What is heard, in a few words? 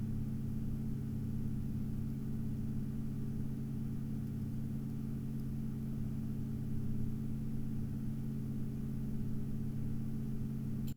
1000RPM